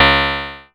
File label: C#, PIANO; Big Fish Audio; Synclav FM